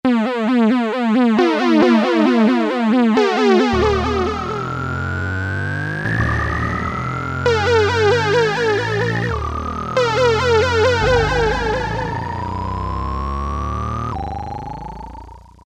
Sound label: EMERGENCY ALARM, Casio Rapman; Tones